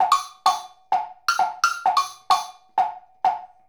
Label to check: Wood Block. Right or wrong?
Right